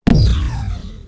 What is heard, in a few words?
laser; spacemap; npcLasers